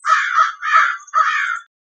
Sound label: black crows cawing; soundbible